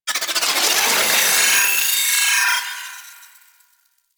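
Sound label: Proto Infested Mutalist Projectile By; Warframe; Abilities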